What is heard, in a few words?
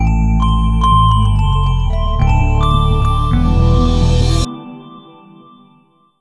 Windows NT 7.0 Alpha 2 Startup; Windows Never Released Soundpack 1